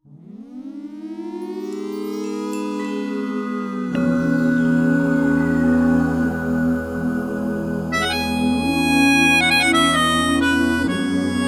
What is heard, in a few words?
Theme Tune (Vocal Version); Bluey; Rug Island